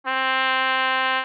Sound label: trumpet